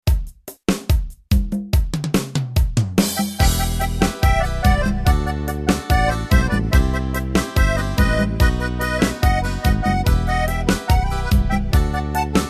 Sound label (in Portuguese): ritmos yamaha arrocha sertanejo